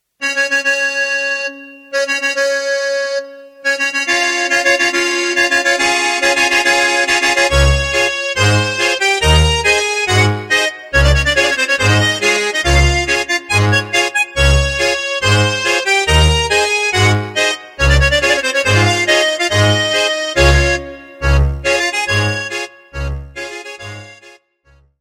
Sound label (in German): Wedding Marsch